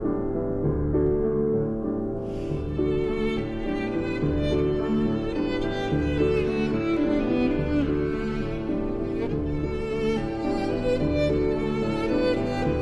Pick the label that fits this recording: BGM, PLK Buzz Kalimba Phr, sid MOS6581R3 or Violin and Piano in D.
Violin and Piano in D